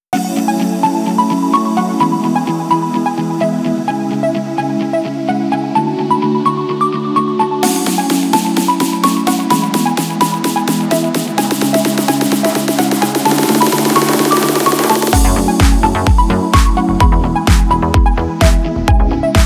accordion, deep house; by burak sound bank; deep house vol 5

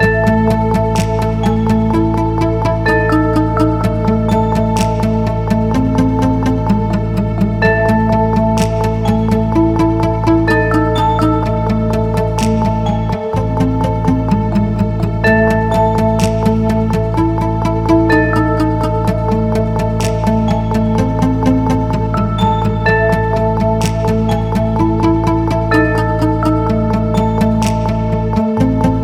tension; tension project; By Burak Sound Bank; tension gerilim vol 18

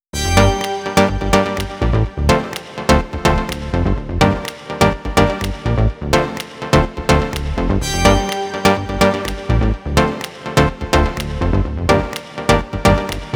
cluphouse, house electronic techno; By Burak Sound Bank; house electronic techno vol 5

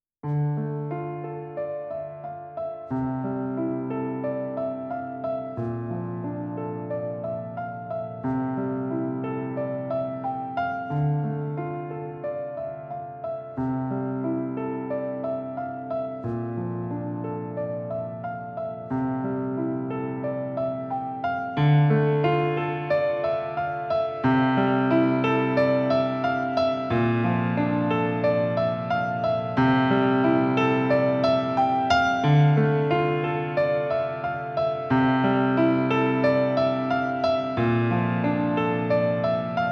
dramatic no violin; by burak sound bank; dramatic dram vol 18

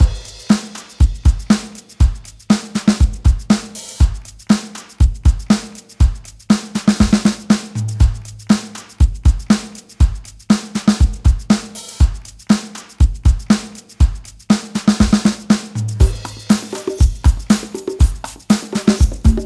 cheerful drum comp davul; by burak sound bank; cheerful vol 9

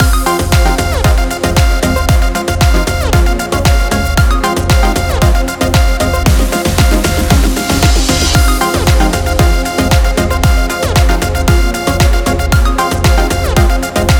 house electronic techno; By Burak Sound Bank

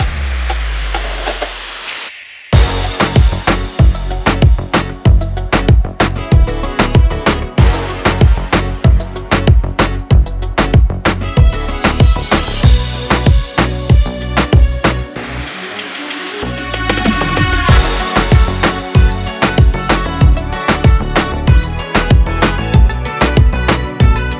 reggaeton; By Burak Sound Bank; reggaeton tema peketleri vol 5

